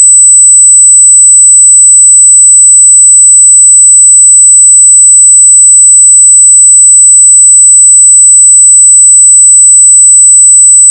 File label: square 8k; fs 48k